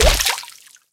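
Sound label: hit weapon liquid water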